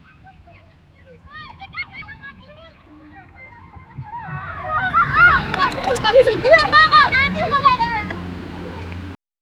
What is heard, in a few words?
we are hearing a peaceful, town